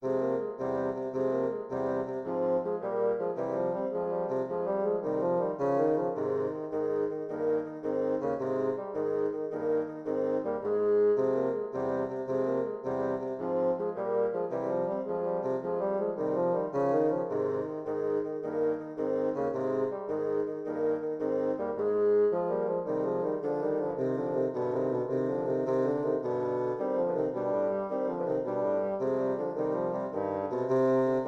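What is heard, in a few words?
gigue bassoon trio; johann mattheson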